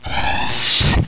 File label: wormhole entry